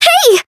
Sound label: Voice damaged; nona